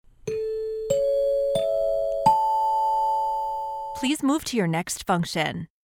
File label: four chimes ascending please move to your next function